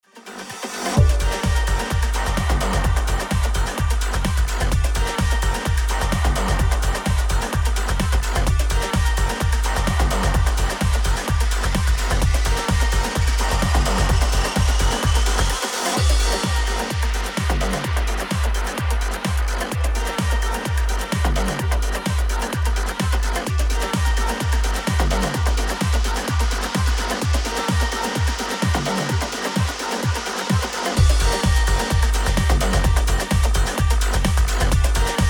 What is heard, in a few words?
Sample House Mixed